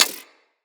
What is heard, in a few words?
Bullet Impact Ricochet